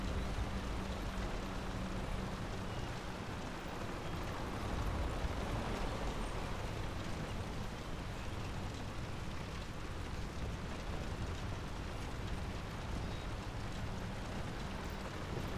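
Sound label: M16 with planes; WAR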